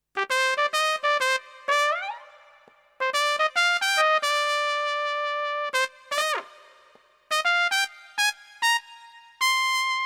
PA600 Brass

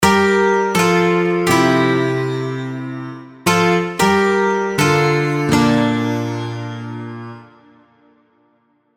Guitar Sitar; Nexus 3; KSHMR Expansion

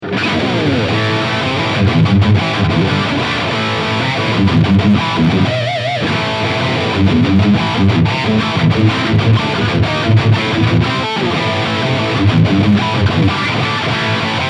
BC Rich Mockingbird High Gain

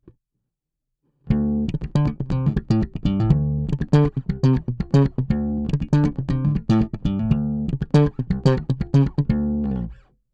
Slap Bass Amps

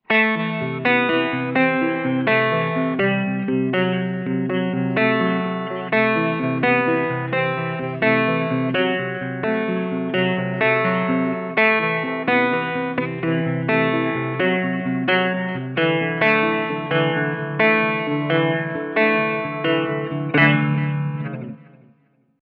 four halftone down

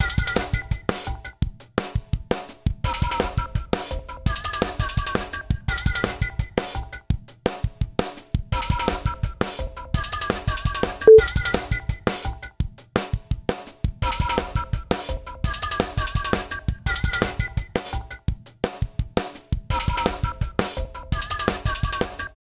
Drums Percussion GR 45dB Release Attack

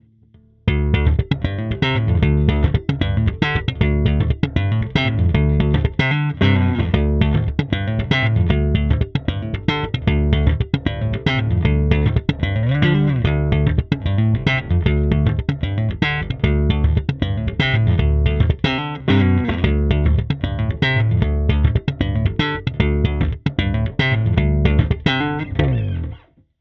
Neckthru Slap Thump Amp

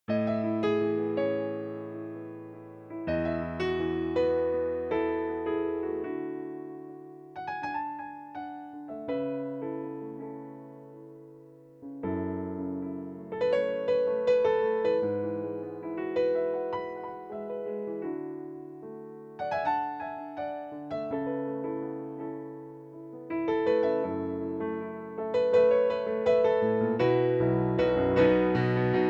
workstation bristol piano; kurzweil k2700

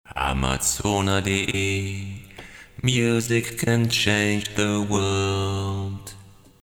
tc helicon perform ve sampler

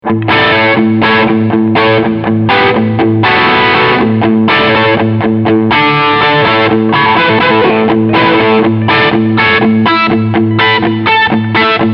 Low Gain

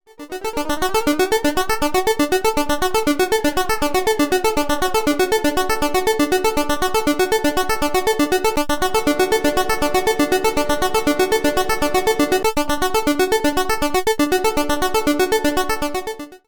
Buzzy Reverb modelle Depth; Freds Lab

